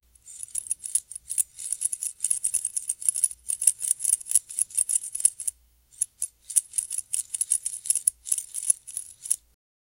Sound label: discovering a common magi, card